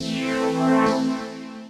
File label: KEY SHOT TEKNO 140 A; Techno2; Singleshots